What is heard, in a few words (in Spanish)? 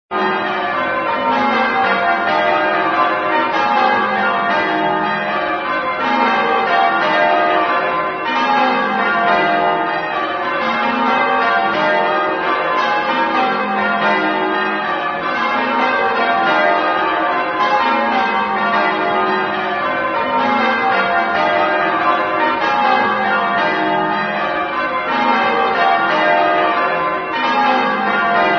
campanas